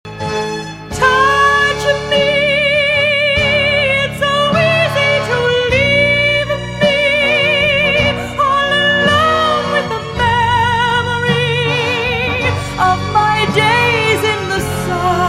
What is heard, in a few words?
Broadway Singer